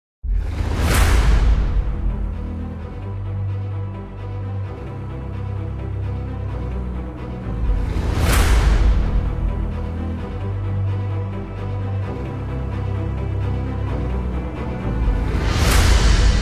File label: Cinematic Trailer Intro